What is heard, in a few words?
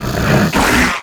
imp attack melee, monsters; Doom